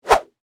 Whip Whoosh Extension, Foley, Whip, Whoosh, Extension, Cable; Whips; Objects